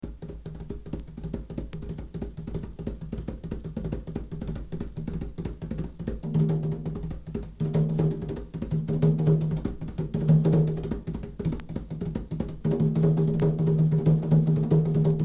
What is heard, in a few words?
African Drums; KPM 1000 series